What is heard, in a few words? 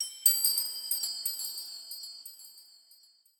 fairy; mob; elf